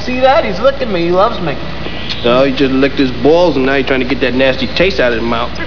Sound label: rewind; cstrike